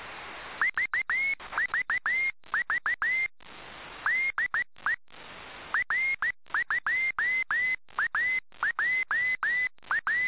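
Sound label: cw beacon; fs1000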